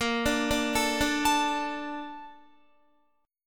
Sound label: A sharp Major 7th, Guitar; arpeggiated; chordshape; 17,17,14,14,14,17